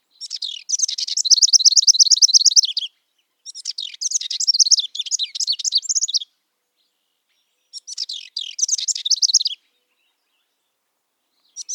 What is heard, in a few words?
housewren